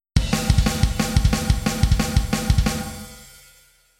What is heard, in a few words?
d-beat